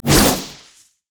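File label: cougar basic attack; nidalee; nidalee base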